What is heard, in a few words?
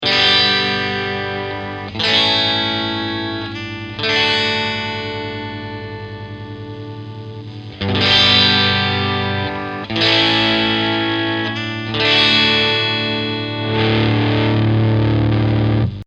Fuzz